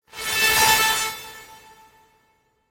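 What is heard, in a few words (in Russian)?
воздействие световым мечом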